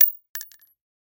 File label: bullet case 228 empty drop